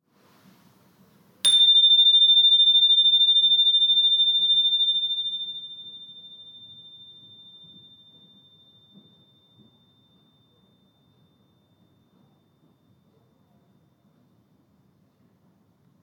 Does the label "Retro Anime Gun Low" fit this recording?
No